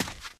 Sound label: dirt, footsteps, walk